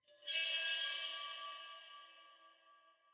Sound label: ambience arena creak